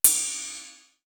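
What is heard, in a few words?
DARK RIDE, cymbal; CYMB.HH; East West; Global Sounds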